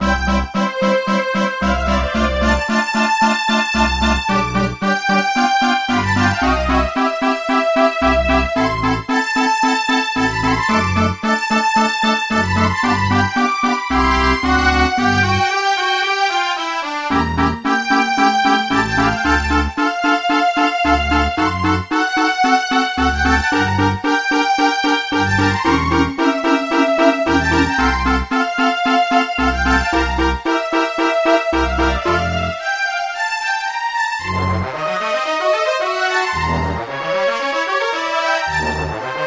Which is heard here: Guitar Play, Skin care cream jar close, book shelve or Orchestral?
Orchestral